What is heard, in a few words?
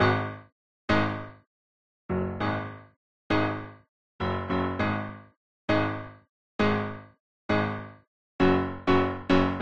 piano loop